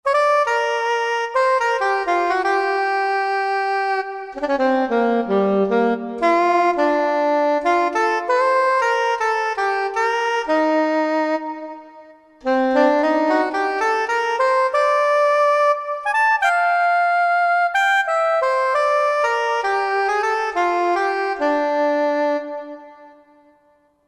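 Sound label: Soprano Sax mf, Roland JV-2080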